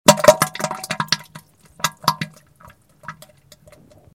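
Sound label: sounds of diarrhea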